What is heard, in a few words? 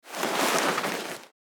Leather manipulation movement noise